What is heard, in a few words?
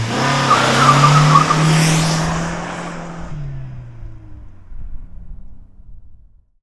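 Burning Rubber, Car Skids; Transportation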